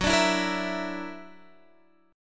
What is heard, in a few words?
F sharp Augmented 7th, Guitar, Drop D; x,9,12,9,11,12